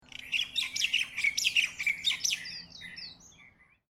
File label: Honolulu Morning Bird Close Up Short; Quaint and pretty birdsong, twitter, tweet, bird chirping; Bird Sound Effects; Lynne Music